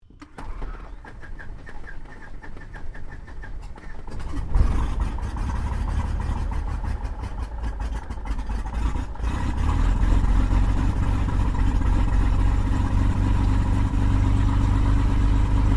AIRCRAFT CESSNA O-1 BIRDDOG STARTUP IDLE TAXI INTERIOR, spotter aircraft; Airplanes